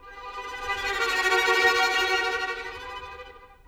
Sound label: Violin; Sonic Foundry (Sony Creative Software); Orchestral VOL-2